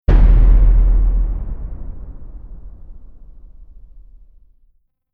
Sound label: Impact Sample